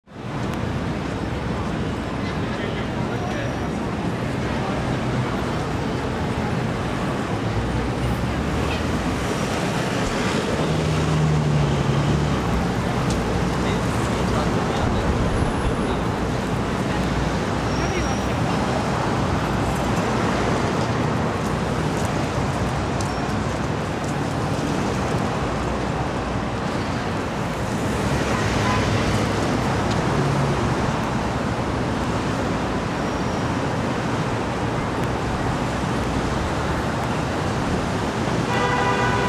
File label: traffic